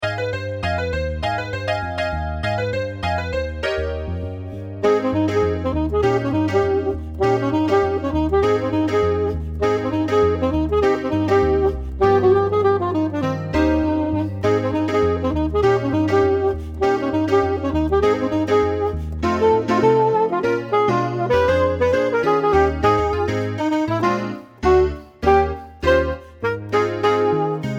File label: sax